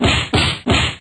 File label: triple punch, Kung Fu; Kung Fu Construction Kit